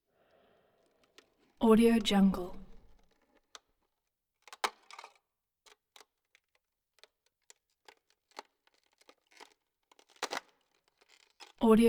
ice breaking

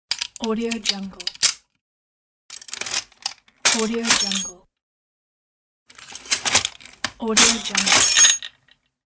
penny gumball machine; applehillstudios

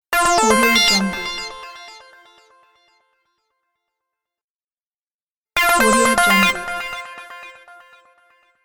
game sound

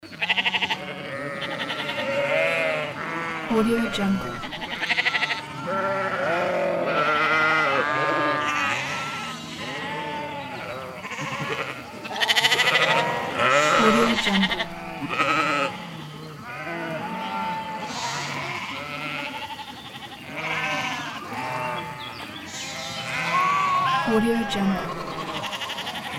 bleating sheep; alanay

